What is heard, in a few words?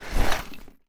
IED Release; zps; Weapons; Explosives